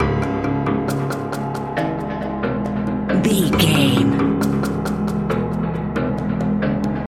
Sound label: wilderness tension loop